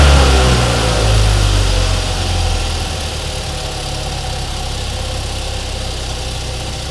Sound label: v8 throttle off slow loop; commoncar